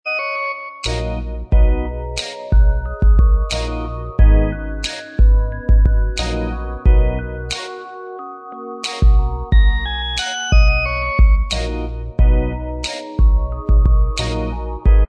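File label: instrumental, backing track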